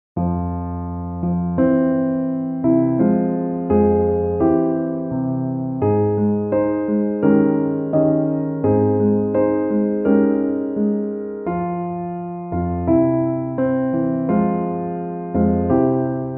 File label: Nocturne - Piano and Strings